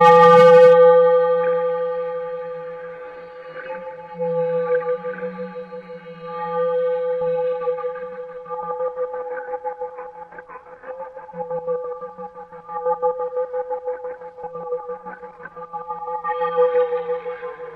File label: Ambient House Ambience 136BPM A